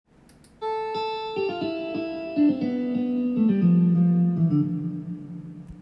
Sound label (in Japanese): jazz fure zu